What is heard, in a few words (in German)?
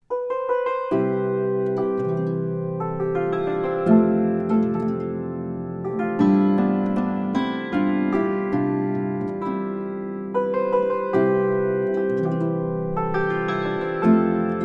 Menuett aus Niederösterreich; ADVENT